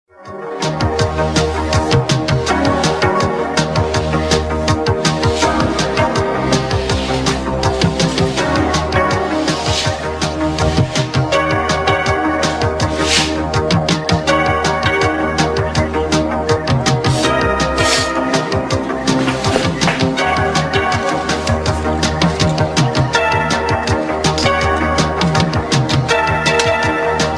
dramatic theme

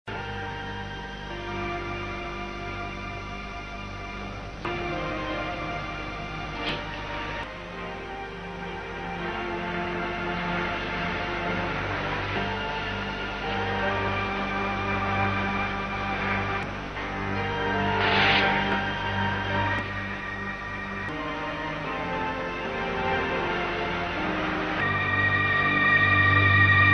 sad theme orchestral

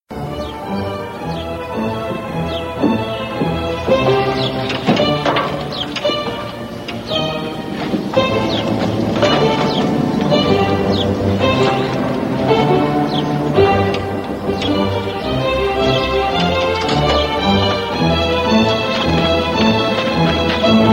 violin

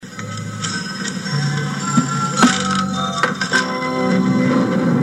scene ender comedic

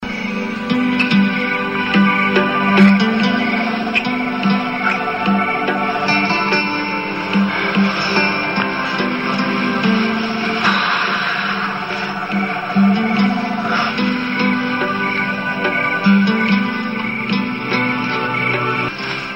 sad theme

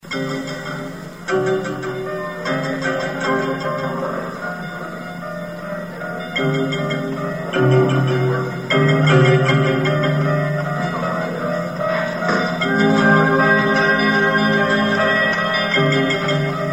church theme

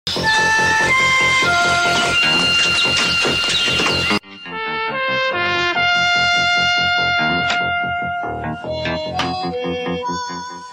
dramatic theme

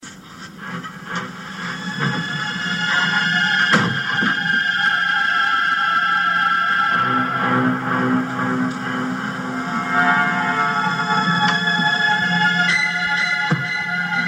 creepy theme

